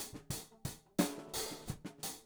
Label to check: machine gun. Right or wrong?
Wrong